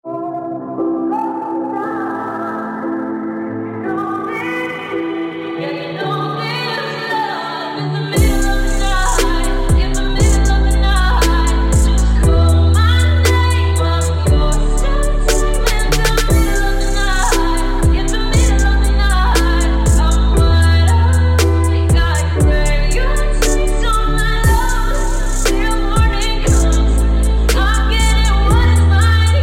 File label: reverb relax remix